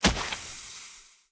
Mushroom Brawler Projectile Hit

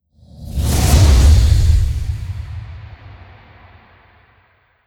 Gargoyle Pass

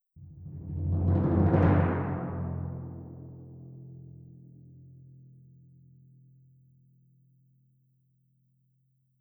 Timpani; Timpani S W

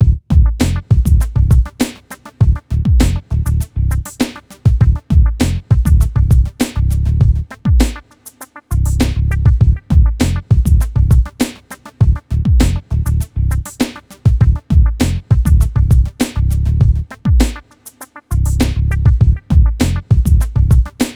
music jungle hut bpm100 long; Donkey Kong Country Returns HD

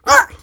Lurker Startle

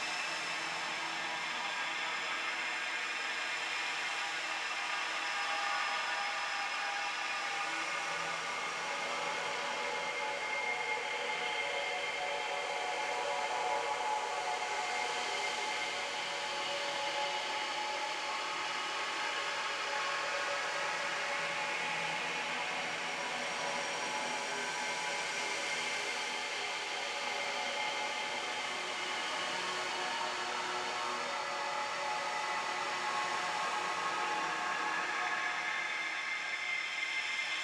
Amb Musical; Sx Amb 2-Botanist

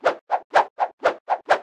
Punching Loop

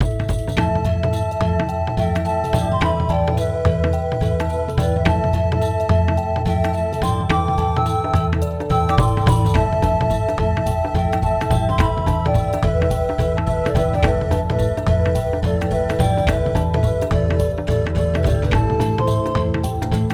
Sad Forest Drum; Klonoa Door to Phantomile; PS1

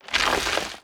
Crunch

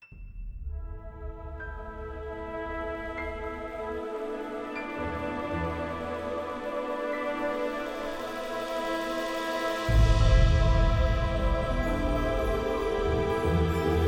mountains music

